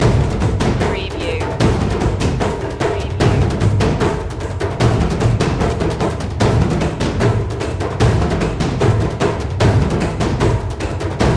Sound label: taikos loop